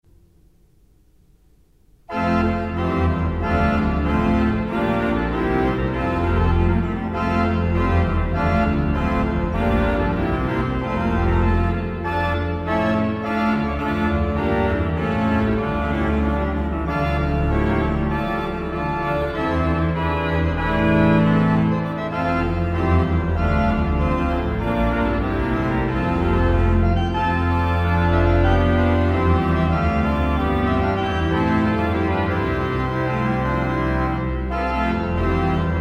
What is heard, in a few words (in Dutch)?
Holfluit Prestant en Basson Hobo met Subbas en Koppel